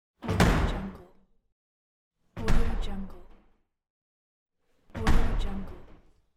glass door closing; urban sounds

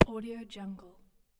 outdoor heavy footstep on concrete; ردپا روی بتن

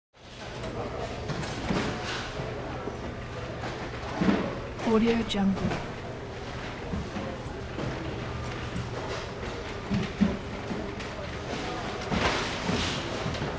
super market background; urban sounds

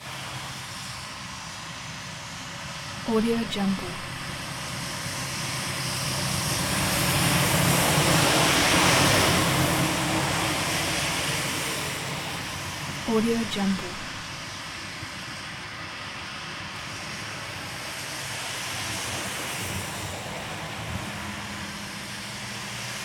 wet roads close to busy road; stereo; urban sounds

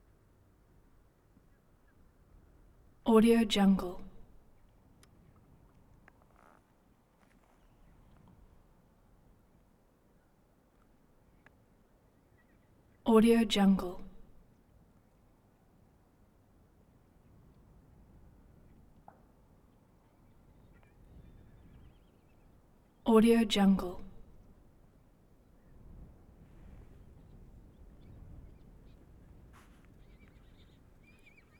military cavalry horses jog constant grass; game; شیهه اسب های در حال

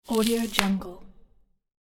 broad bean pod crack; domestic sounds

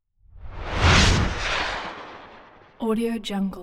whooshes fast rocket; transitions and movement

